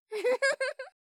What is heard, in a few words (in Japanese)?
含み笑い, ASMR; 相づち・反応系【ポジティブ】